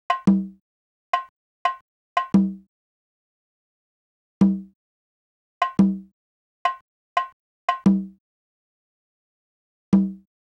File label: Percussion, Emin